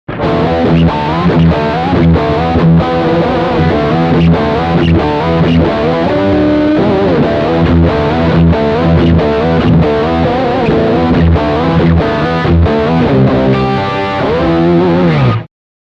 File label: 6 String Riff